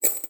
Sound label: Coins